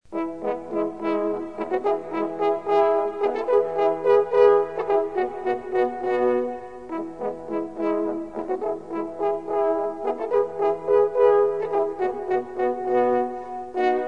Fanfara

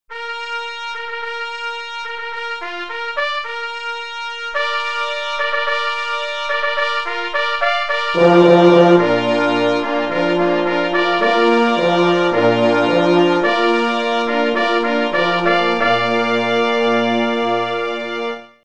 Fanfara na podniesienie

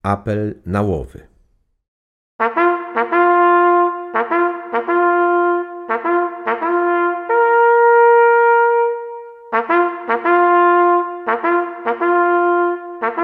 apel na lowy solo

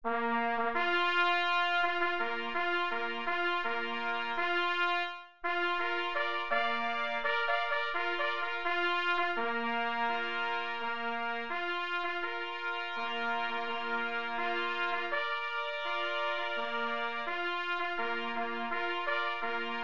Hejnal instrumenty jednorodne

